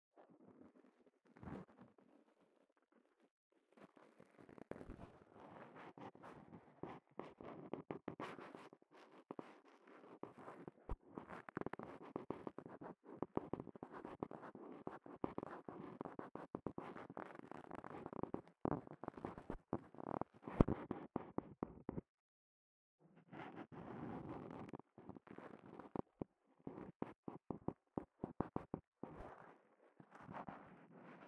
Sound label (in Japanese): 竹耳かき 奥; KU100